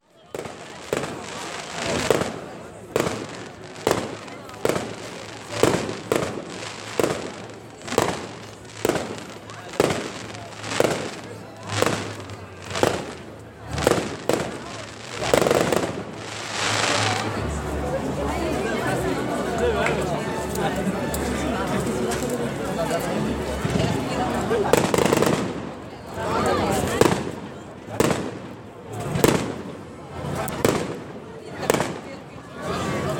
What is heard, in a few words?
fireworks; san martino di lupari